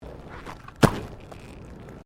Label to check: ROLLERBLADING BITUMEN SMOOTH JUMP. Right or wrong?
Right